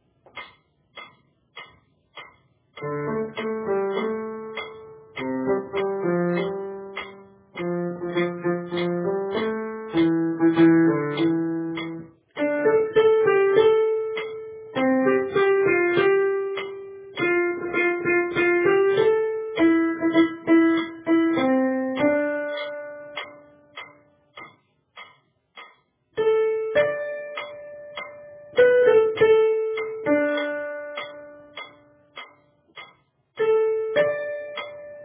Forgot Who I Am Soprano, Accompaniments

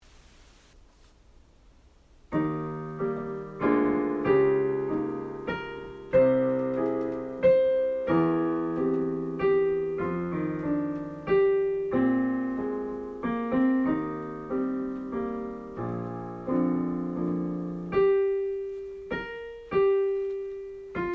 Riverside Dreams Soprano, Accompaniments

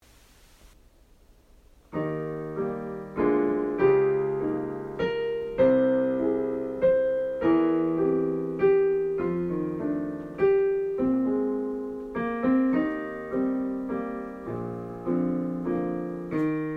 Riverside Dreams Bass; Accompaniments

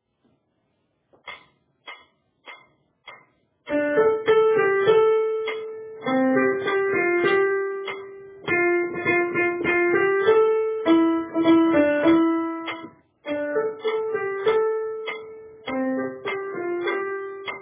Tenor, Accompaniments

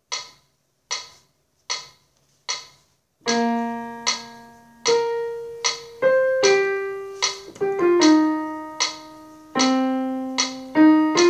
Misty Rain Tenor, Accompaniments

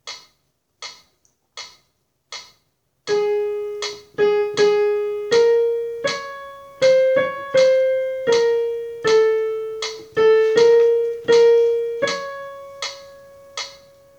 Spring Breeze Tenor, Accompaniments

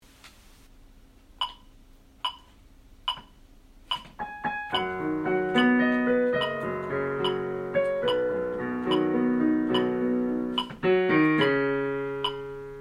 Glory Sunshine Bass; Accompaniments

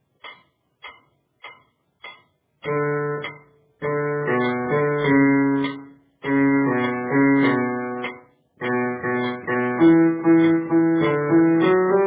Forgot Who I Am Bass; Accompaniments

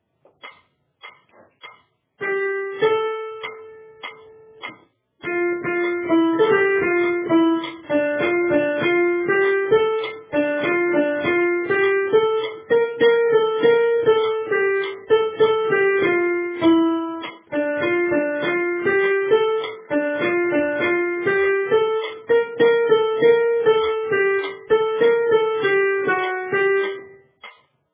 Forgot Who I Am Soprano, Accompaniments

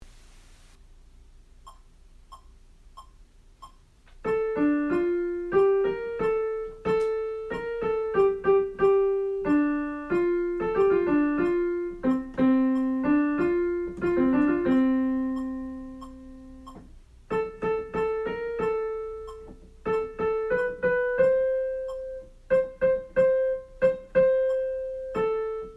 Farm Ballad Tenor, Accompaniments

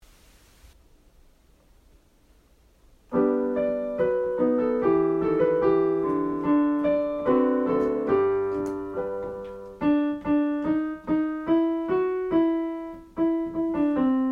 Alto; Accompaniments

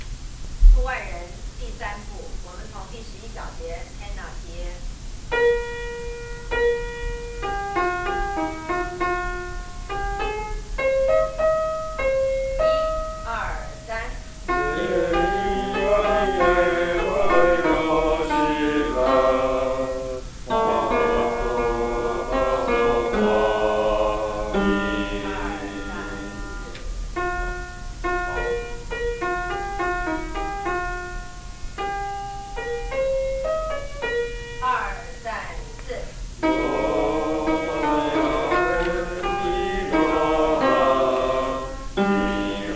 Sing, Bass, F Chorus, from Tape; Accompaniments

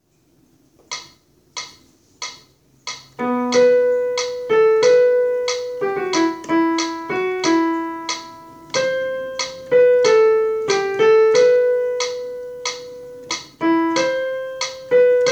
Heavenly Star Soprano, Accompaniments

